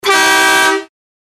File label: Horn Honk